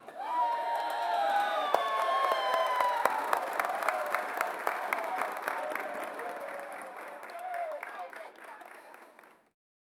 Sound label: cricket crowd slow clappi